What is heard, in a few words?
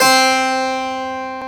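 Harpsichord; CMI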